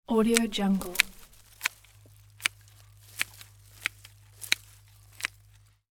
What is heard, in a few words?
pruning plant; domestic sounds